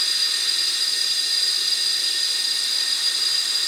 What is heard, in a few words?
Pipe Hiss Loop; Dystopia; injection